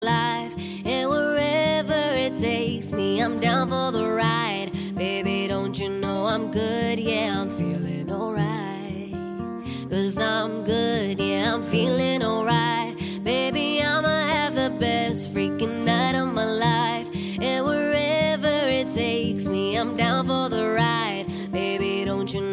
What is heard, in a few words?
Acoustic